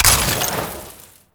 Ammo Pickup Heat; Ammo Ability; Warframe; Warframes; Frumentarius

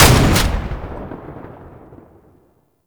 Lasrian Tank Airburst Cast; Weapons; Warframe

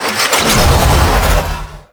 Moon Air Lock Open; Ambience; Gameplay; Warframe; Orokin Moon

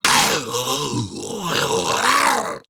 Laugh Grineer Ghoul Sargeant; Grineer Ghoul Drill Sargeant; Battle Barks

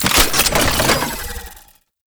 Concrete Archgun Reload; Warframe; Archwing

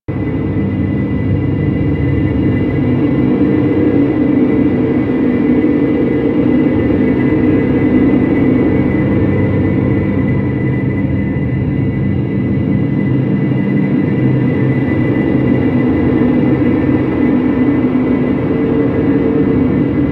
Ice Interior Hallway Amb; Warframe; Corpus; Ice Planet

